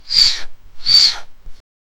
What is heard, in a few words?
melee